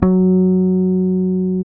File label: E-Basses, Roland